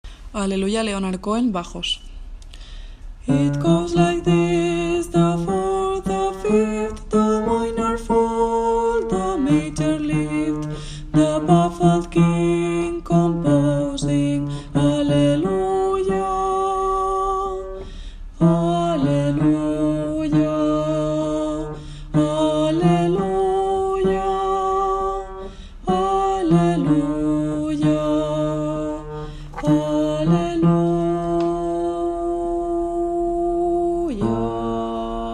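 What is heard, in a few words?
bass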